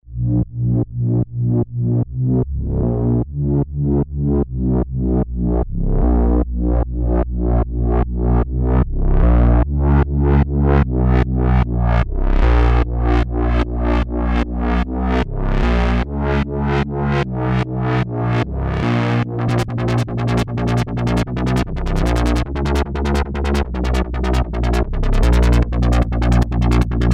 dubstep bass; ms20m

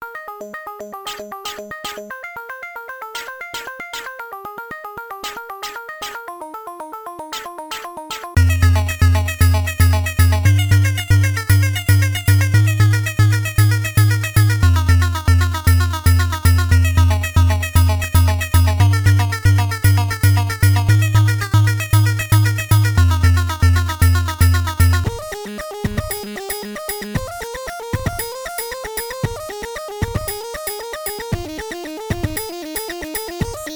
Atmegatron

